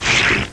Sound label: oil; Ferazel's Wand Demo